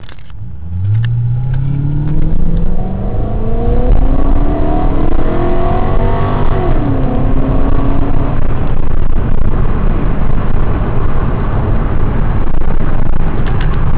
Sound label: door closed